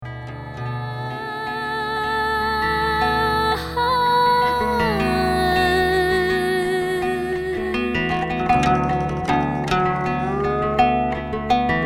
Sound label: experimental Illustration wuzen lyre qin